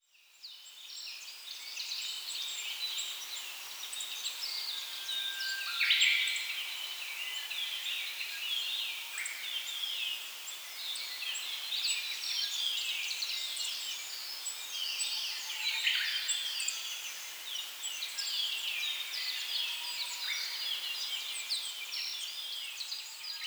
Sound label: bird